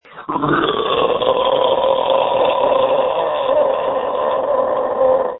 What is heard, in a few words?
screams